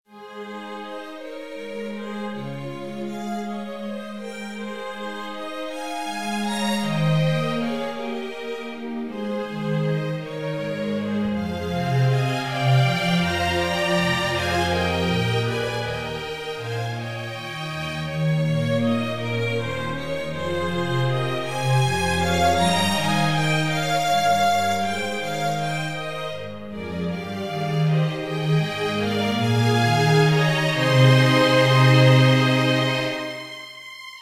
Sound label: string orchester